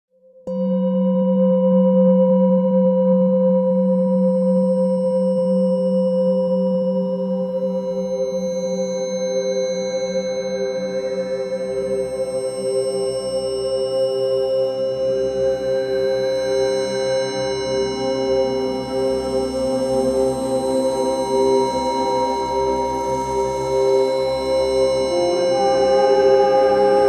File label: Love Frequency Meditation